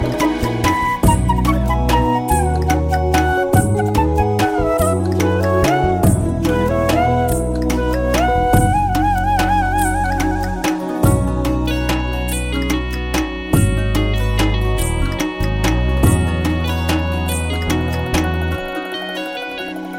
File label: Dhak Dhuk Music